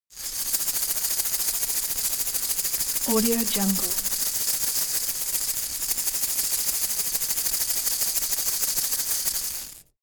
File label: creature abstract insect shaker rattle hard